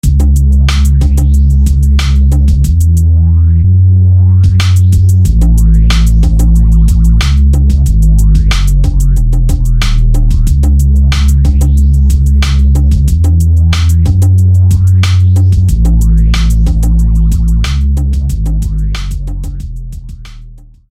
Quasar Bass; SFB FX

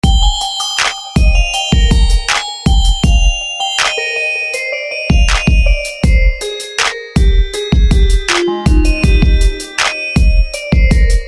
Baby Lullaby; SFB FX

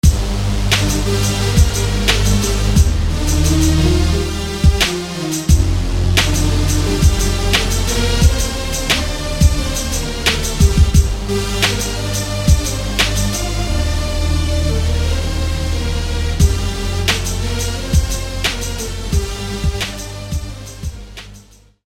Saw; Saw Bangah; SFB FX

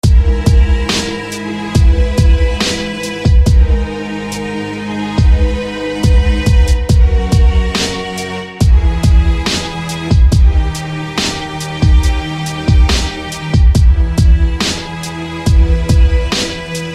Prime Trio Strings; SFB FX

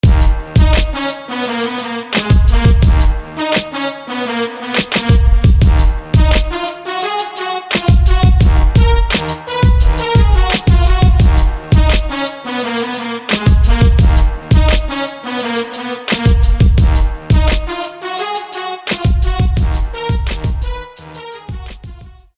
Brass; Stroke of Brass; SFB FX

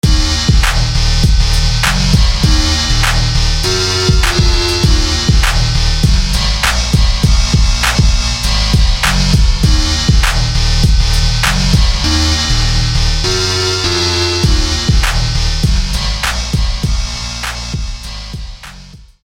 Dragon Synth

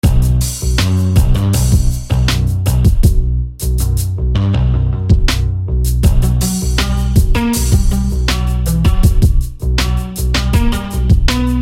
Mississippi Bass; SFB FX

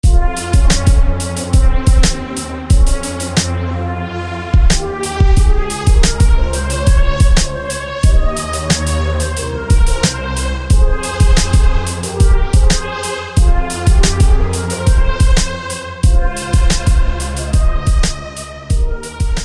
Brassy Wah

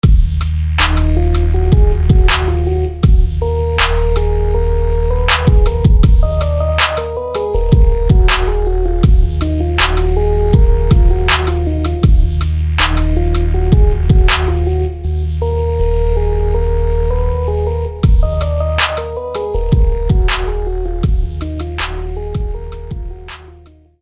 Buzzburn Synth

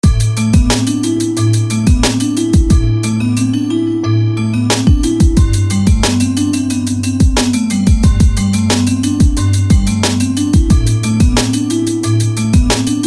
Glockenvibe

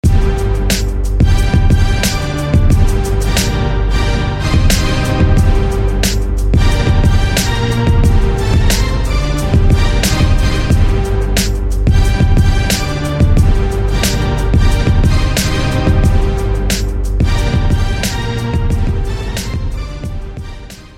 Power Orchestra Hit; SFB FX

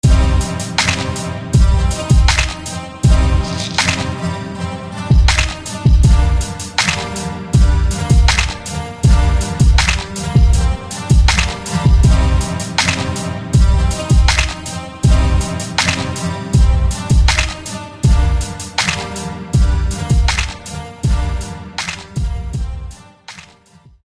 Nylon Tunnel; SFB FX

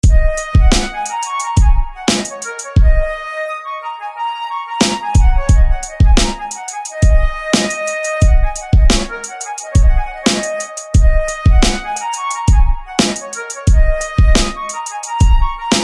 Regal Wind; SFB FX

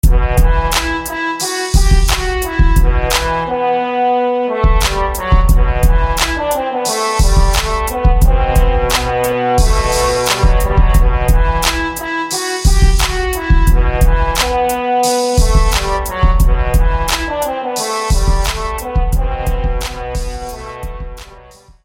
High Trombone; SFB FX

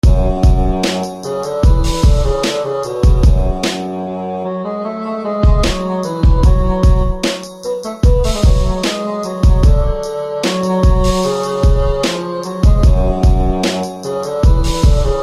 Flared Bassoon

